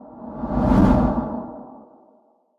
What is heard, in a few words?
Lo-fi transition low passer by whoosh